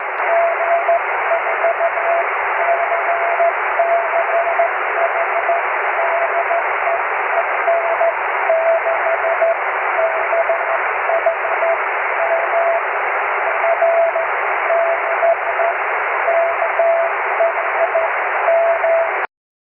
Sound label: cw; 23cm